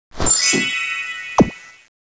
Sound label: reload, weapons; magicmg